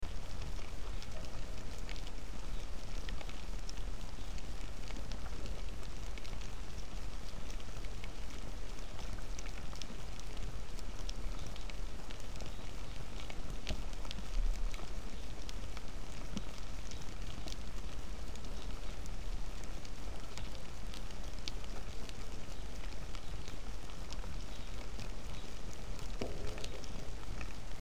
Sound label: rain